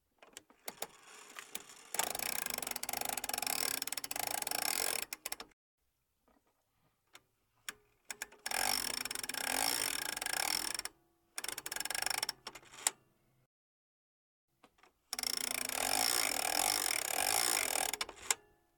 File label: Cuckoo Clock, Wind-up; Colin Lechner; Colinized Cuckoo Clock Library